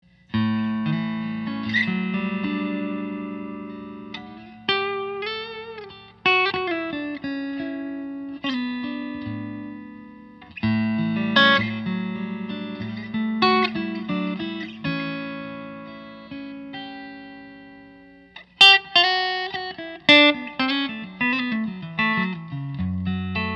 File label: guitars; C F Holcomb Kodiak; maple; spruce; 12 fret